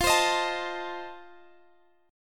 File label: F 7th F down Guitar Open G